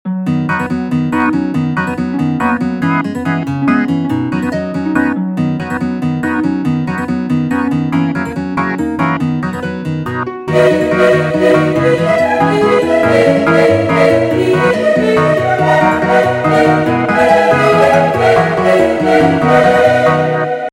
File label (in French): Farandole